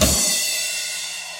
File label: Crash